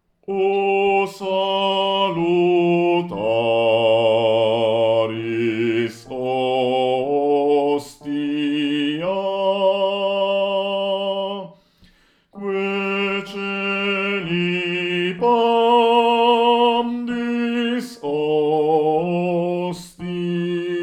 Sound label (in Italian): Bassi; O SALUTARIS HOSTIA